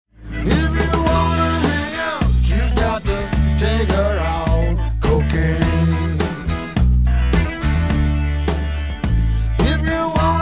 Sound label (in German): Musik, Schallschutz